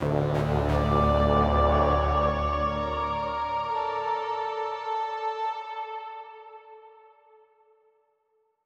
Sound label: Castle Loop End (Presto)